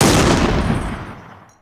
axis anti air; WAR

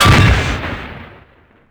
baz hit metal; WAR

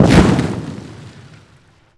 medium impact generic; WAR

